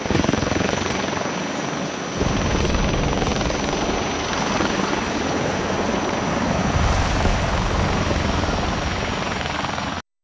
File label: Music; Gunship 3D FPS